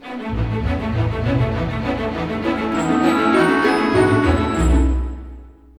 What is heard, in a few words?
BIKE; East West; Scoring Tools; ORC; Bike Ride